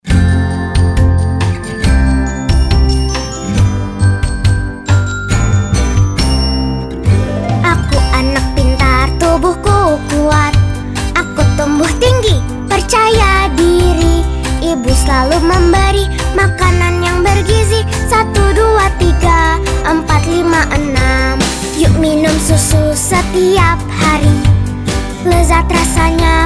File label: JINGLE